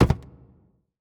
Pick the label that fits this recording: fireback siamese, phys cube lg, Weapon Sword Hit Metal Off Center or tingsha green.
phys cube lg